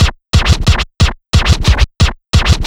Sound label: Old School Scratch